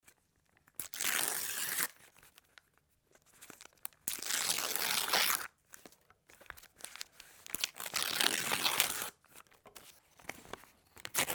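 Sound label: tearing paper sound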